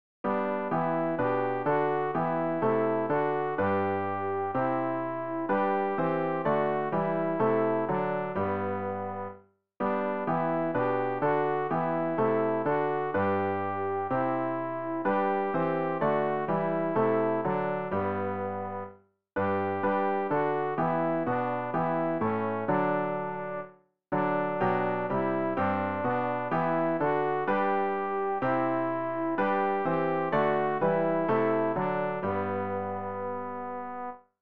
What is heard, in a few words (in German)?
alt, Übehilfen für das Erlernen von Liedern; ref gesangbuch; rg 291 gott deine guete reicht so weit